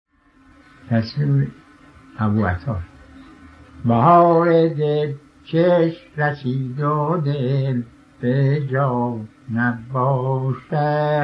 tasnif bahar; aboata

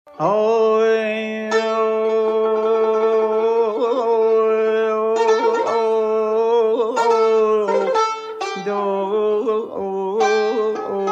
zirkesh salmak, shour

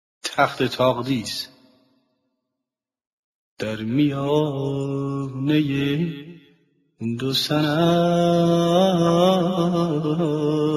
takhte taghdis, nava

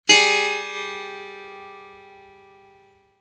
setar la b

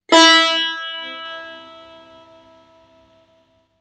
tar, "mi"

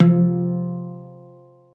hang

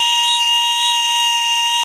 ney si

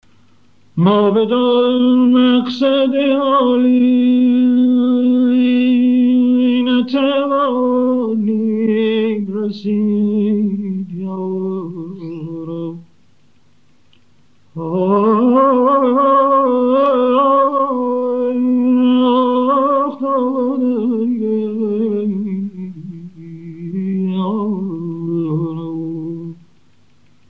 zangole, rast panjghah

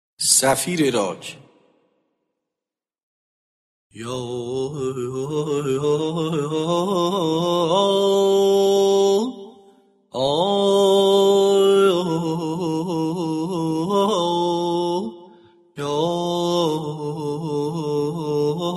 safire rak, mahour

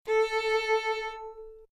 violon la

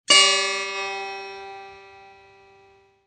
setar re c

